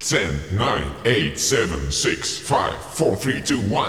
VOX GENERAL; Megabass Remix! 2

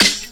SD, snares; EastWest; Dance Mega Hip Hop Electro

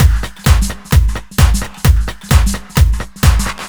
130 Klub 2 Death Full, Drum Loops 130bpm; Zero-G; Soundclash Nu Dance Electro; Acidized Wavs

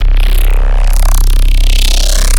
Gritty Bass Texture

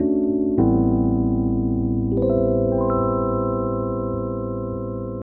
E.PIANO, KEYS

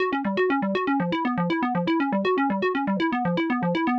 SH101 Arp (120BPM), retro house

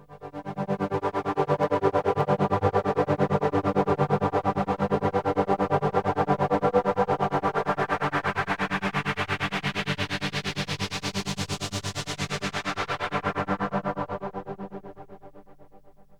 MOVEMENT, AMBIENT PAD

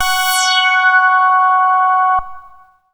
MASS LEAD, SYNTH; Keith Emerson Signature Series

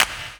Clap; Equipped Music; Loungin House

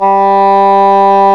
ENH, Horns; Sound & Vision - Gigapack I CD 2 (Roland); Brass&Woods1

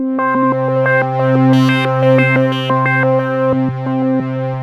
RANDOM PAD, OLD SYNTHS; Rubber Chicken Software Sample Bank

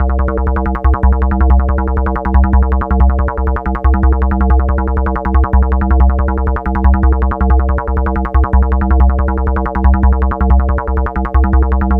gro 160, Hardcore Loops, Bass Loops; Dance Synths

